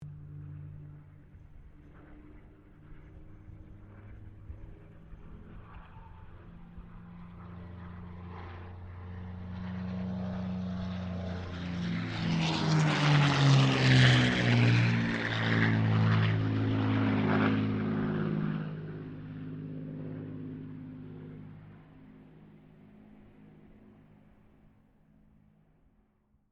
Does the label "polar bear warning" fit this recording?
No